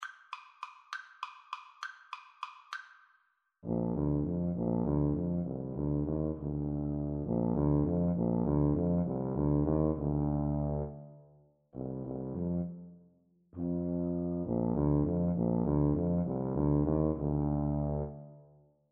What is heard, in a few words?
Tuba duet